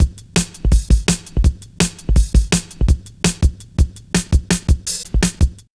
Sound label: reece steppa, drum loops; Zero-G Jungle Warfare 1, 2 & 3